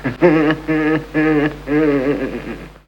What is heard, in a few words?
laugh